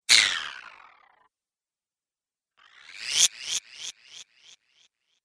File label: Magic Spells; Magic Sound Effects